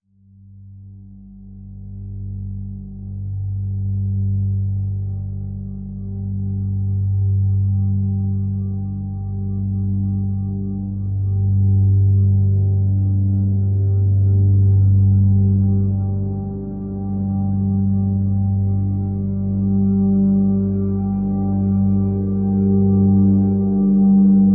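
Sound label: drone G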